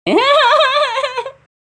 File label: Funny meme laughs